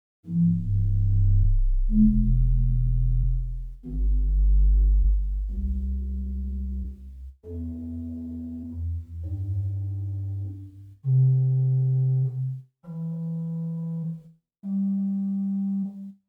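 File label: Pedal Bourdon 16 arpeggio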